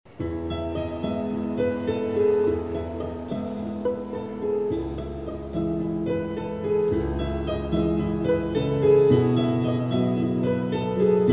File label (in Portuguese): solo sax Piano e Cordas